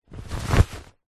foley clothing shorts thick cotton open pull inside out